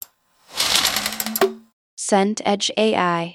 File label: window blind roller